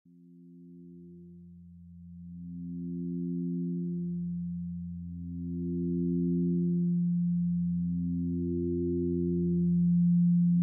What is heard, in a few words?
174Hz Energy Activator